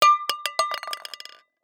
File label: can tin empty drop